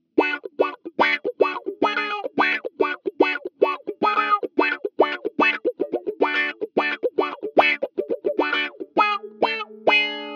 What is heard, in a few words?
Tron Down